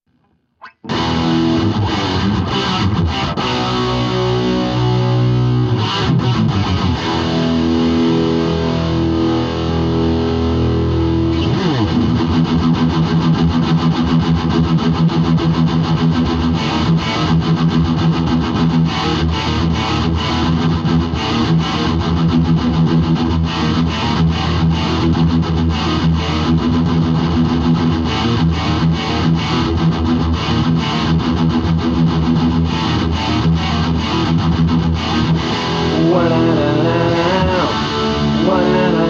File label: Riff Tapes